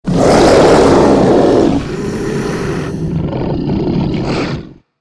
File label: T.Rex Roar; dinosaurs